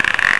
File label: CREAK; RpgMaker